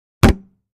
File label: Gallon Jugs Strike To, plastic